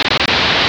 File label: walkie noise; DeadFright